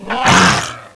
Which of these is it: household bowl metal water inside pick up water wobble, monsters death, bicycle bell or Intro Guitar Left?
monsters death